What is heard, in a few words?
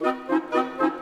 Orchestral Loops; 128 VERTIGO